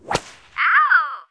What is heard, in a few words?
Whip; ScummVM; Simon the Sorcerer Puzzle Pack (CD Windows)